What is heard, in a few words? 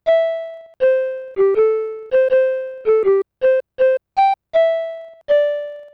enemy lullaby; SNES; SMRPG